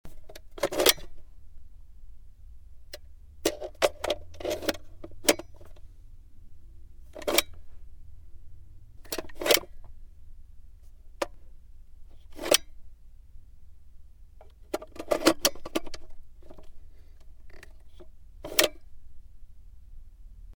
pistolet pulemet tompsona

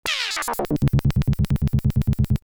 sintezator

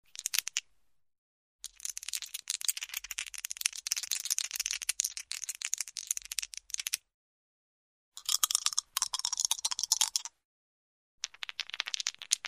tryasut igralnie kosti

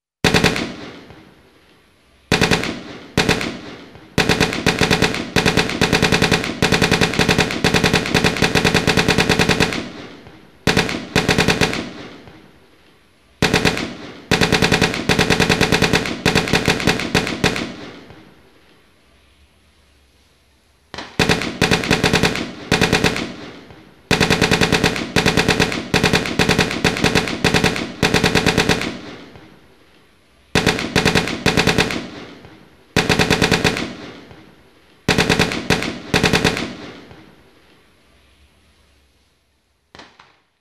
pulemyot strochit iz bunkera

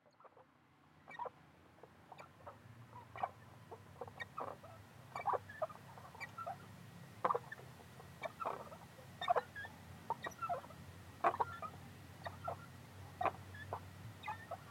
tihij skrip kachelej

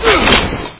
vorchanie

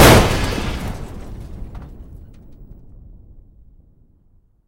razrushitelnij snajperskij vistrel

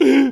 zaikanie ot smeha

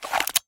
vzmah barabanom revolvera

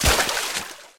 vsplesk vodi udarivshejsya ob kamen

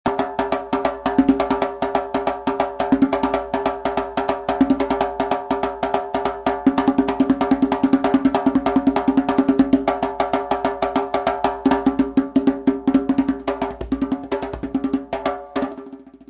perkussionnij zvuk

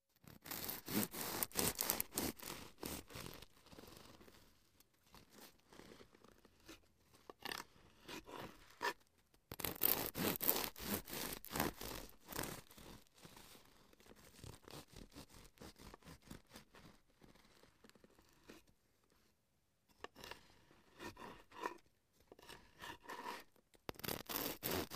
razrezanie hleba chyorstvogo svezhego

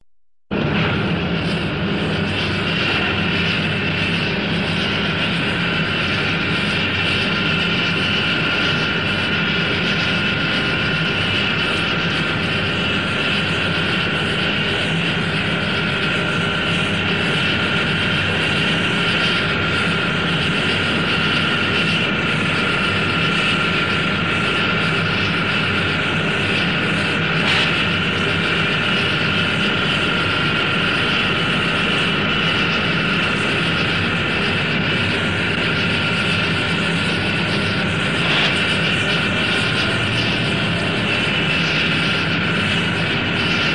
zavod shum i zvuki proizvodstva obshaya atmosfera

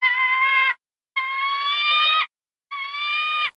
zvuk sokola korotkij

